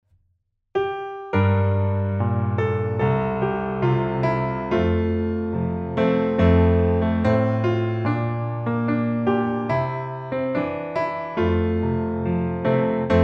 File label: Piano; Giljaskoli